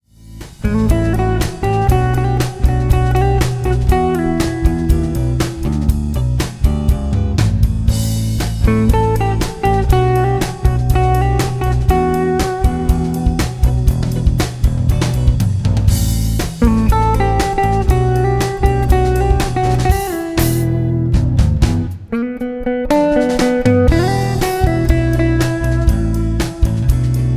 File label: Dinner Jazz